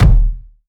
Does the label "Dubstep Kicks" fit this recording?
Yes